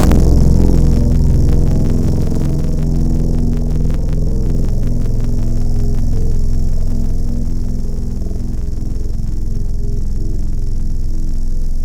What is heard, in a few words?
Futuristic, Tropical Mallets; Synthetic's Serum Collection; SparkPackers